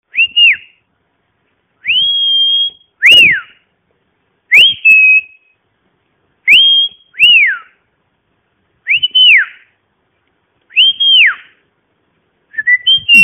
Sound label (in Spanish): silbido silbar